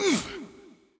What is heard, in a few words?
player grunt